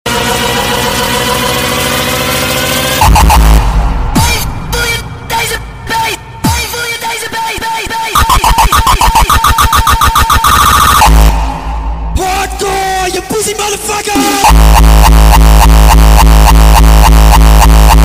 hardcore loud

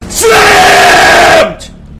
slammmmed

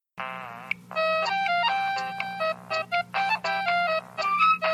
nokia arabic ringtone funny

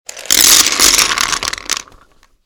power outage

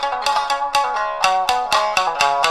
shamisen

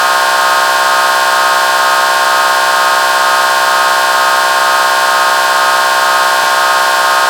horn

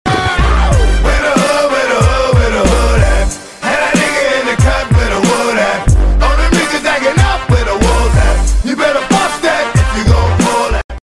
twitch follow donation sound

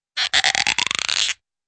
rubbery creak

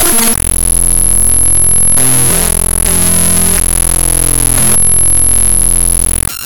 laser

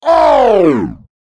heavy oooooohhhhhuuuuuu

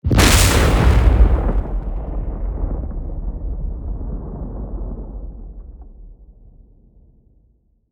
thunder sphere; horizon

